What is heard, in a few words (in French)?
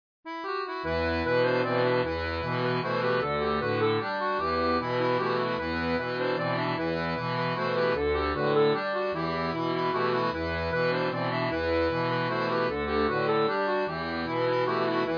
Mazurka 2 voix; Ecoliers de Saint Genest; tablature pour diato a 2 rangs